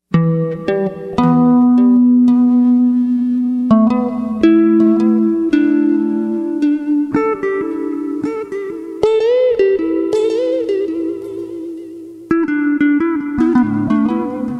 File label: jazzy bluesy echos